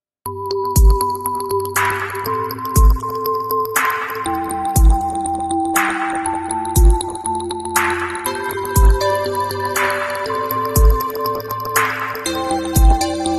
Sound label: Mobile Ringtone